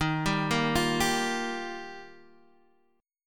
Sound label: F 7th Suspended 2nd Suspended 4th Eb